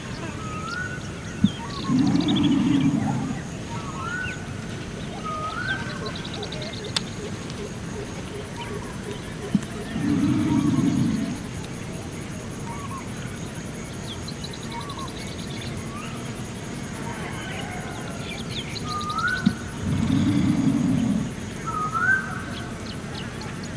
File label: Australian Bustard Ardeotis australis